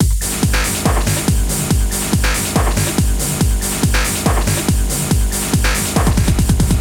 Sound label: Techno msg